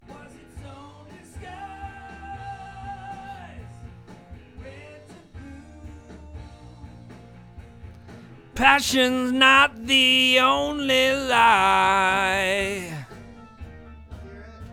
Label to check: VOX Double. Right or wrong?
Right